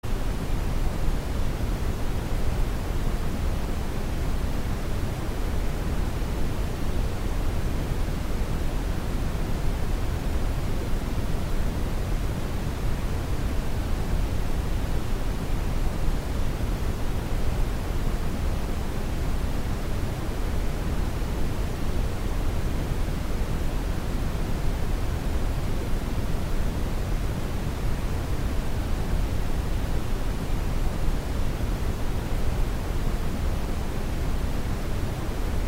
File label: WHITENOISE